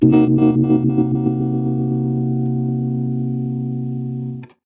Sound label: stereophase